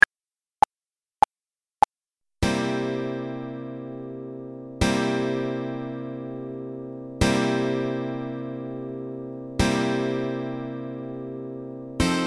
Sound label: 12 bar blues example, guitar